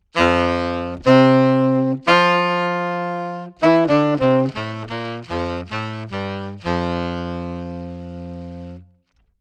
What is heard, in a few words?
Dry, Octave Down; Saxophone; Pitch Manipulation Pedals